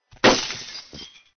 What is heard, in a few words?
breaking glass